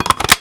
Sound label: mag in